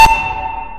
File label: beep